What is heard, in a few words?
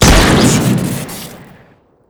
m29 shoot crit